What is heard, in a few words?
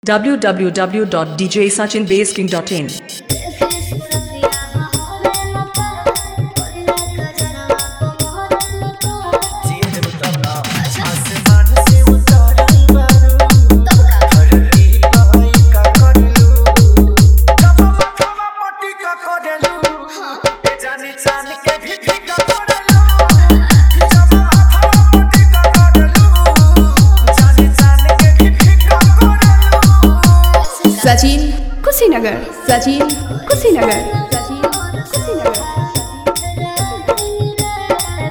Bhojpuri Song Hard Vibration Bass Mix